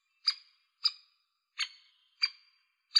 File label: Dendrocopos major